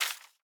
phys impact paper; Rust

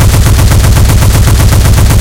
ho301 loop; guns; War Thunder

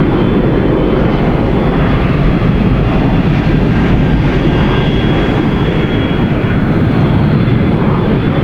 large cargo jet move; veh large cargo jet move

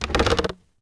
Catapult Heal; Civ4 warlords

